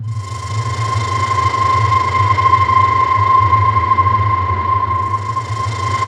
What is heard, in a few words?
drone c, Alien & Drones (atmo); ambient; Chillout